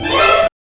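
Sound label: string run; X-Static Goldmine 1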